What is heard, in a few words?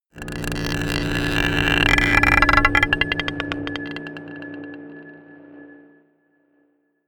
scrapes marble pot dark, drones